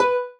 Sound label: marimba rainbow; ゆめ2っき